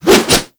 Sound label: Axe Spin Swing; Warframe; Weapons; Swings